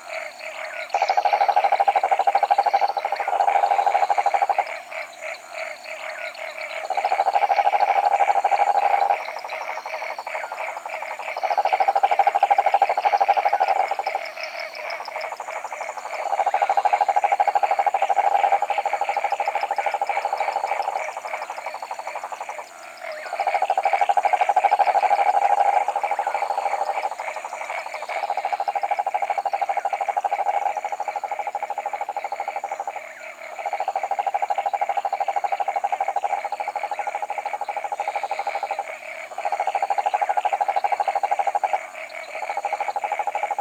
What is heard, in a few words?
copes toad rhinella diptycha; lyagushek i zhab